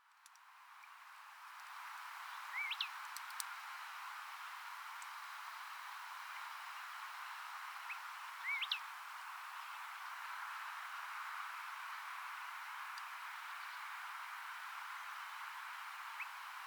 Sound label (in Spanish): Diucon Xolmis pyrope